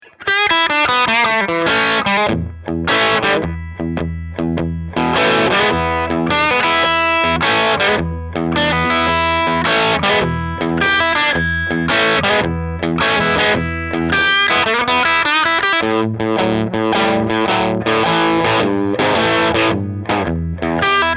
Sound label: Les Paul P90; Brownie